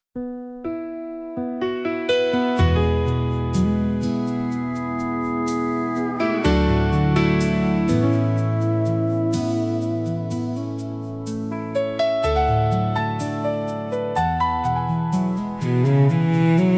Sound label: VIOLA and Voices